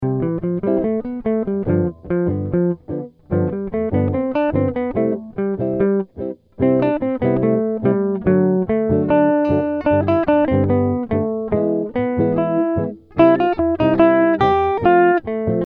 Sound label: Classical guitar etude